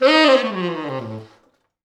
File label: T Sax falls, Horns Sax